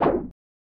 Swipe

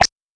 schyte throw; gonner linux macos ps4 switch windows xbox one gamerip 2016

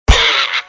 Pigglette death

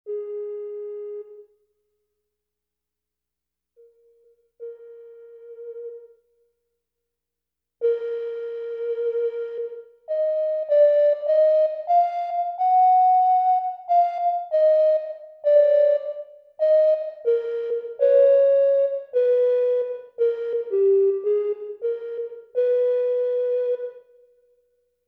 Ocarina; Arcturus The Curse and Loss of Divinity

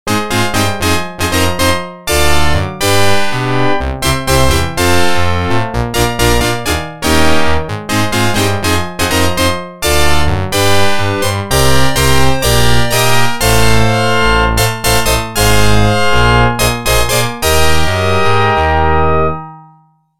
Organ; NHL 94

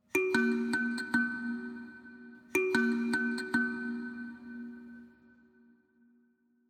Kalimba Layer

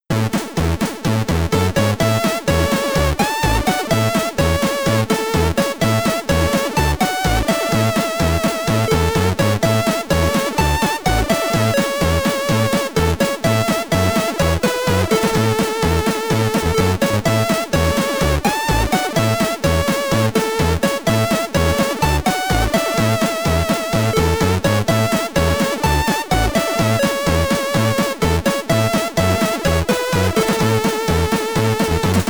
SID Stereo, commodore 64; Eskimo Games; Miami Ice

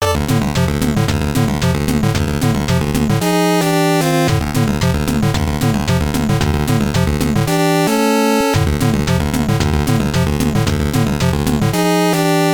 Tank Boss; p.o.w. prisoners of war 1989 nes

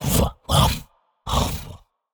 Death Vo; Shadow Minion1

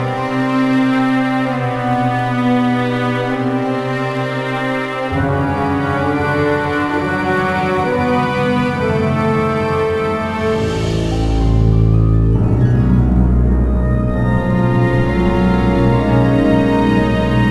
Music Low; game rip; Legend of the Guardians The Owls of Ga'Hoole; nds

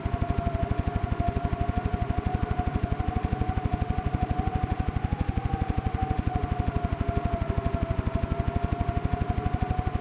Ship Deck Wind Loop; 10 Second Ninja X; ps vita ps4 switch windows xbox one gamerip 2016

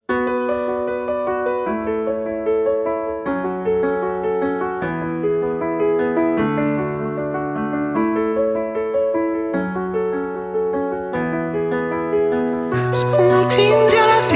Piano Version, Pop; The Sims 4

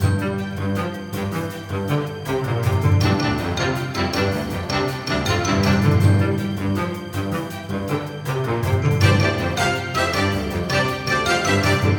pre trainer battle music; EvoCreo; Android game music

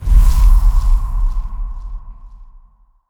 shadow create start

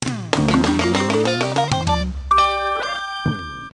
Jingle Normal; tamagotchi connection corner shop ds; Kuchi

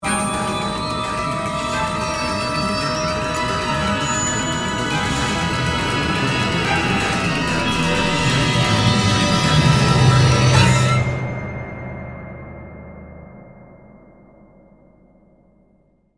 Death (Stinger)

